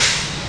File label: bash